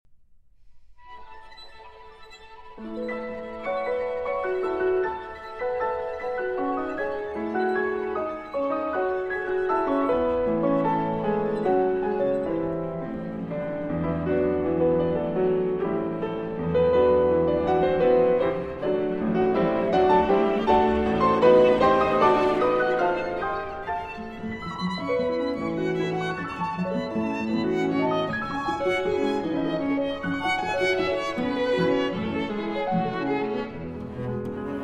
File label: Piano Trio in A Minor, Animé